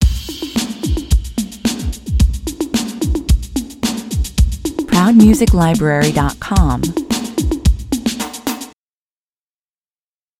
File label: (loop drums)